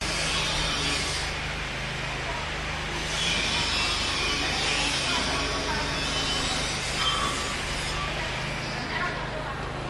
Construction Site Angle Grinder Tool Sound Metall Falling Down; Budapest Hungary